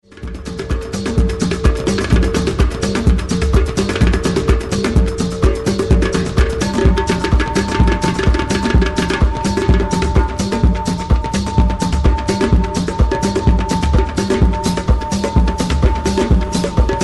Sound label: percussion